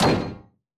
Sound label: frag physics bounce riotshield; gmod; viper